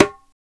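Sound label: perc darabuka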